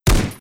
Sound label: Gun shoot